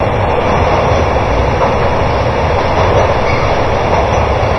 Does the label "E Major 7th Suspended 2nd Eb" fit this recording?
No